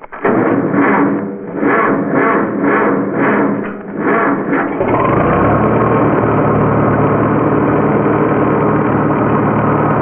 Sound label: farmall start